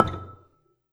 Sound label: Gambang Soft Beater, Gamelan